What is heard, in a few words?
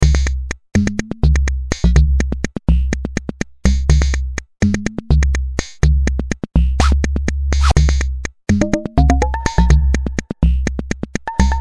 Beat